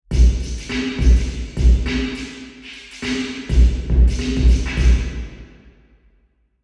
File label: drum, symphony hall; usina del arte